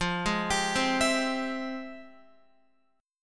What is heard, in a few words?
F Minor Major 9th, down, Guitar; FmM9 arpeggiated; JGuitar